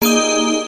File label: bonus chance; Lucky Zodiac